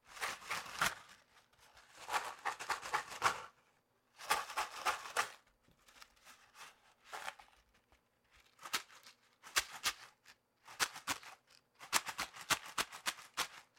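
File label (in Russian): шум при тряске при использовании коробки с лапшой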